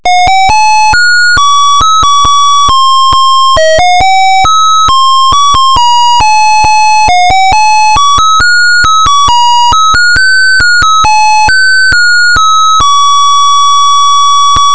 -, melody

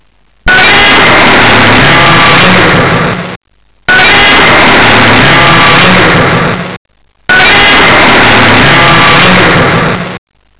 dinosaur

